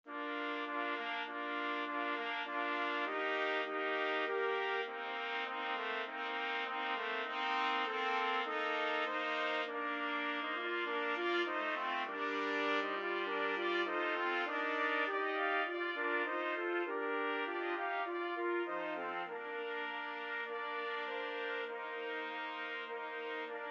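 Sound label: 4 trumpets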